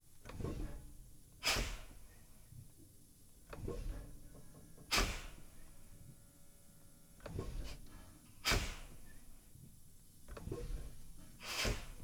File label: Peterbilt 359, Brake Pedal, Interior; Detroit 1968